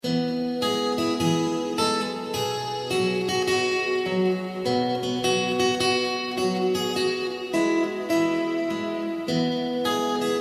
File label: hush little baby lullaby